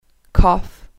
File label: cough; english